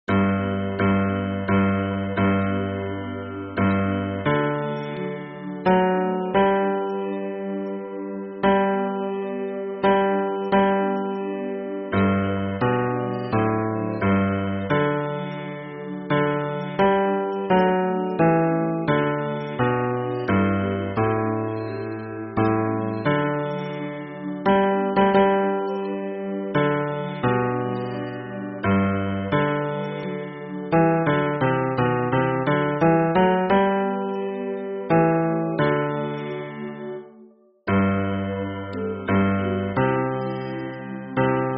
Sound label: hymn bass